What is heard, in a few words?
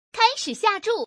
start bet